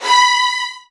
Virtuoso Strings, B3; ILIO; Kirk Hunter Virtuoso Strings VOL-1

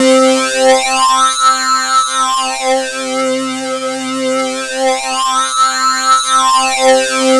CLAS LEAD, JV-1080; Syntec; Wall of Sounds

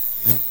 FLY, anime sound fx, comic

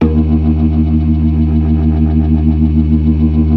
ORGAN, 4 DRAWBARS; Keith Emerson Signature Series

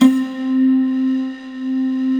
SYN GLASS; Sound & Vision - Gigapack I CD 2

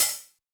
HH, Yamaha DJX 2B

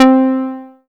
Rubber Bass; Best Service Gigapack 2

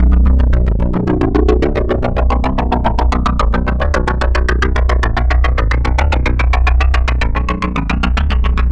Synth Arpeggio, Synths; Eclectic Electronica

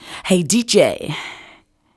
VOC, Disco Fever VOCALS; Best Service ProSamples vol.48 - Disco Fever; AKAI

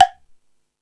Jazz Drums, E-MU Pro-Cussion

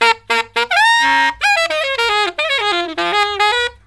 SAX, Killer Brass Riffs

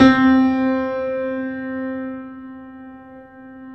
PED FF, GRAND PED

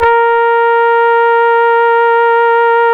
A#, Synclav FM, BRASS; Big Fish Audio

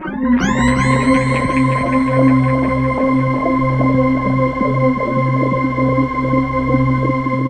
Pad; Dream Zone

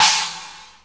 RX5 CHINA; Hollywood Edge; The Big Bad Beatmachine

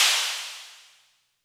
Cymbals, Elektron MachineDrum SPS1 MKII

